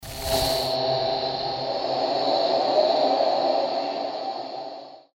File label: swarm projectile; weaver